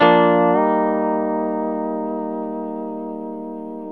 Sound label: A# TO D#, Pedal Slides